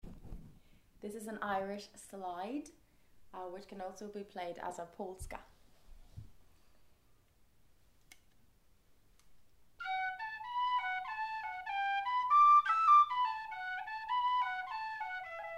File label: Irish slide